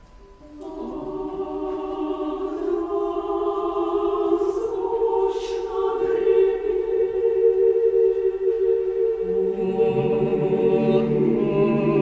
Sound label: Monotonously Rings the Bell, oktavists; russia; the Lonely Bell Rings